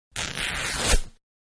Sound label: Cloth-Being Torn-Medium; Ripping; Sound Effects:Objects; Manhattan Production Music